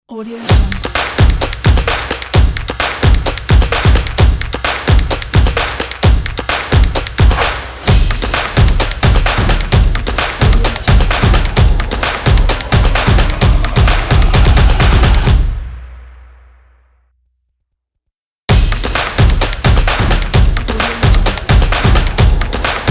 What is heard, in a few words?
logo sport percussion